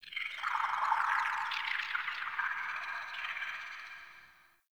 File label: Tunnel Critter; Warframe; Tau